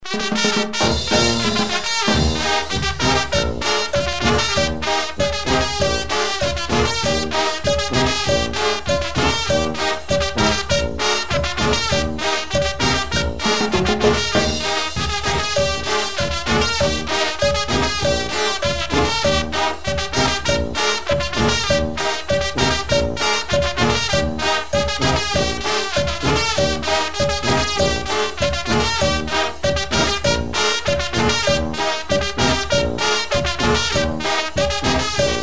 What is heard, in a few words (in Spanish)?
tepehua cumbia extrana